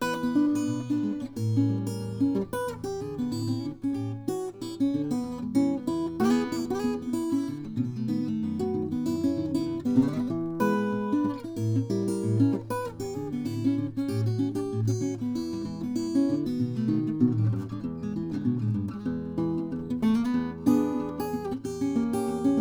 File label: Gibson L-00; 1934; Gene Bush